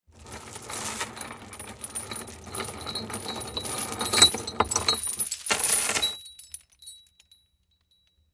Chains Drag Rattle

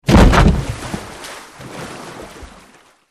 Boat Impacts Water

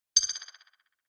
Quick Elect Ping

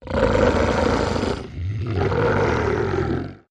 Werewolf Growls, Close Perspective.

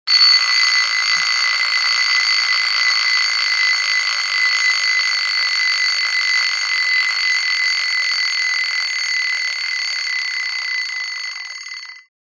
Small Traveling Clock, clock chimes rings

